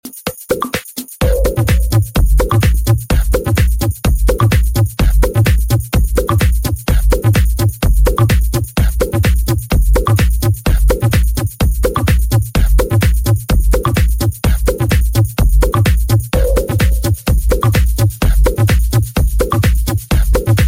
cool minimal

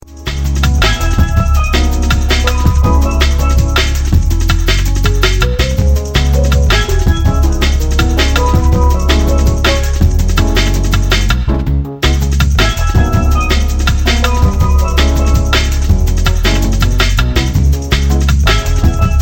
marimba dnb

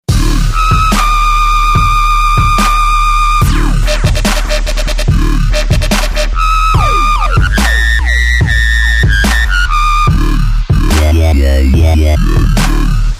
dubstep

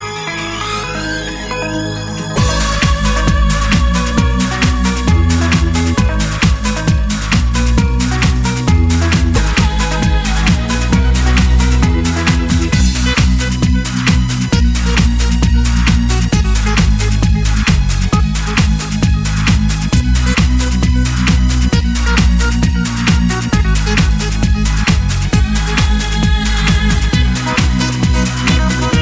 remix trance

